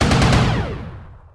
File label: AR Fire, weapon